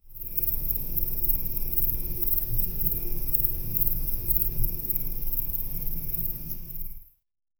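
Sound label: magical humming soft pulse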